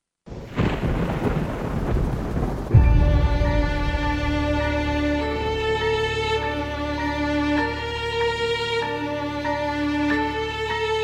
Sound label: Thunder violin